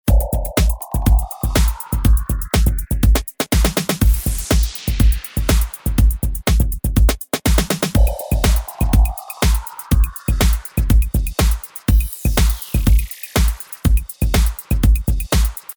Beat Loop; vortex attack ex windows gamerip 2019

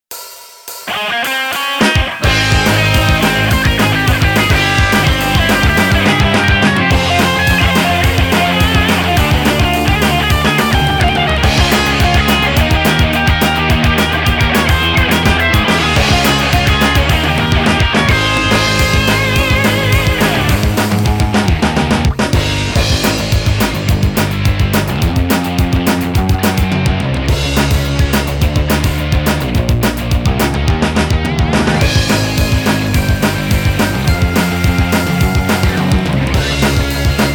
Vocal Minus Track

